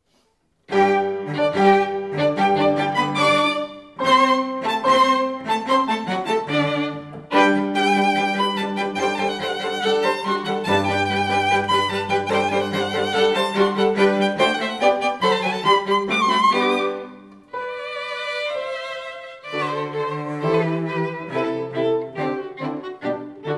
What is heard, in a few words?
Eine Kleine quartet